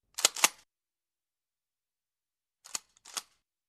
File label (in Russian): Zatvor pistoleta perezaryadka